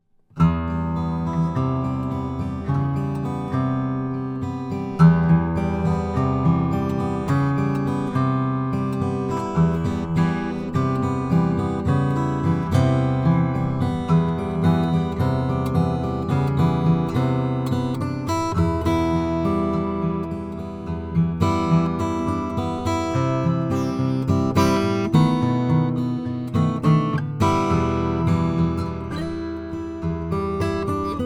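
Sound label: Breedlove myrtlewood acoustic guitar; northwest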